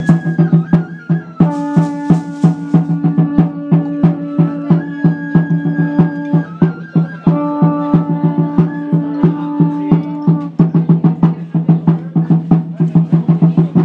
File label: drums